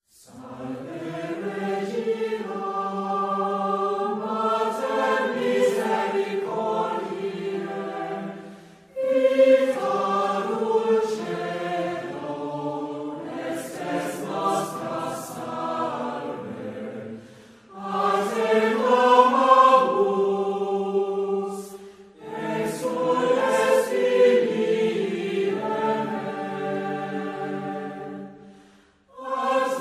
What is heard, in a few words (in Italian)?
salve regina in latino